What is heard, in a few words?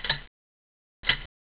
clock